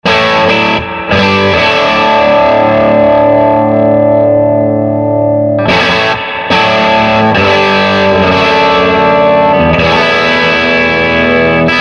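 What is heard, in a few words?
Tele crunch, Nocaster Tele; Swart AST Pro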